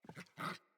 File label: Kubrow Puppy Idle Growl Playful; Vocals